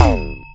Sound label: post